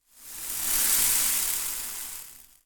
foley small plastic beads on metal tray tip to side